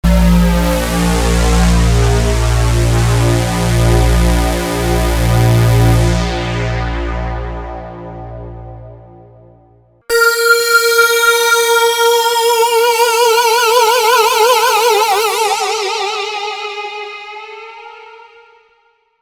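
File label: DSS Sync Delay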